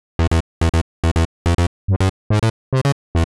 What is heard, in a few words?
Warbs 142 E, uk garage, Synths